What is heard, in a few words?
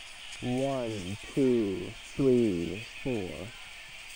blended solution background noise; knowles